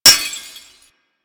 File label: Glass Impact; Warframe